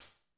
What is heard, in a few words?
Korg 01W Dance Kit; 300 Drum Machines